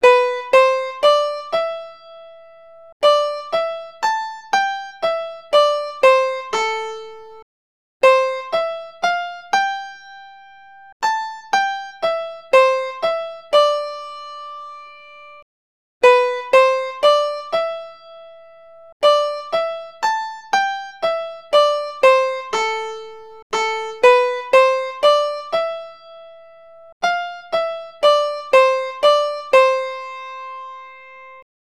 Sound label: piano song, piano (鋼琴) 聲合成; Csound pvoc